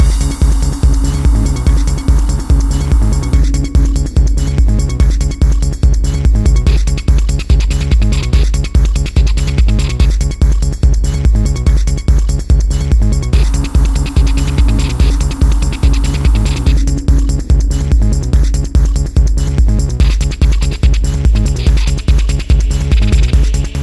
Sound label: chase; Mirrors Edge